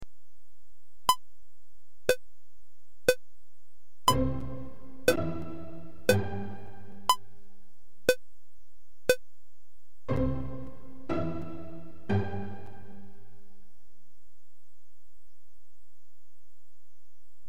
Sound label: Minim Example, guitar; 3/2